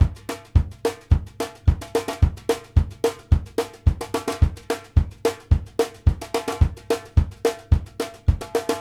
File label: BEAT B, BRUSH 108; Sampleheads - New York City Drumworks VOL-1